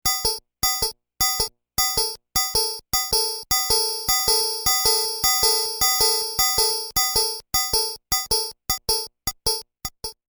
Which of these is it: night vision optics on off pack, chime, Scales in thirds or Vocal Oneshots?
chime